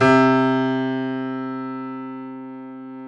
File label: KEY C 2, YC7; Roland L-CD701